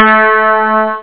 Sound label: harp